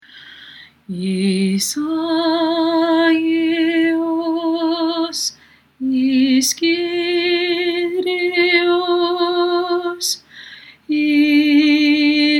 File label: Eis Agios Greek